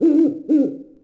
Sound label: bird owl; DAoC